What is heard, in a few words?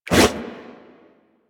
Sword Swipe, Whooshes